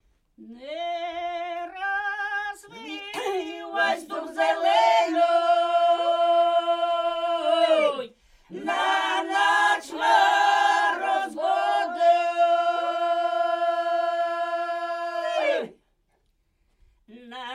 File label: ukraine polesie, polyphony